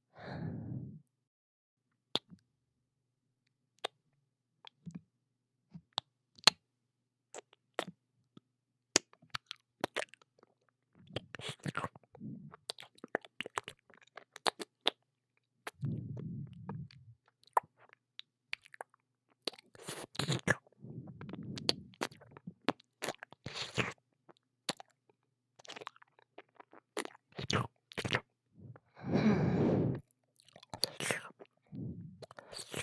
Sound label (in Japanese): 八舐め 右